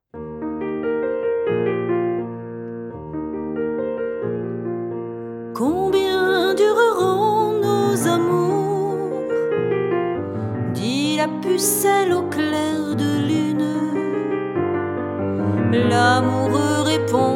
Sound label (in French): Chanson voix et piano; IUAV